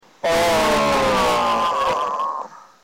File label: oaahhhh; Wald